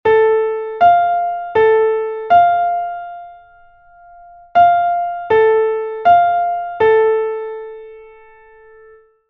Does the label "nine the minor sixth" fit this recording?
Yes